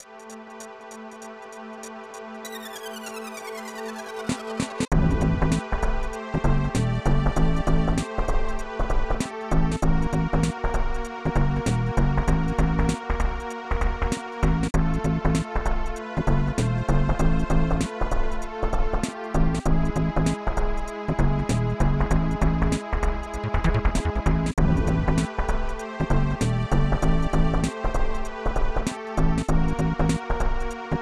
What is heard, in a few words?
A Tense Atmosphere; SD Kidou Senshi Gundam V Sakusen Shidou